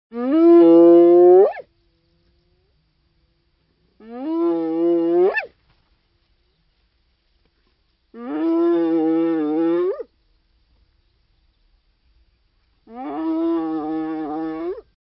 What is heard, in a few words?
hyena whoop